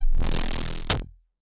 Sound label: hang trap; dos lem